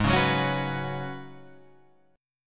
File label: F sharp Suspended 4th G sharp; strummed; F#sus4/G#